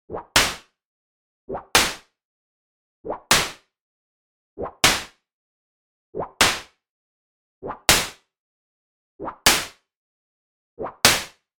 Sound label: Whip Cracks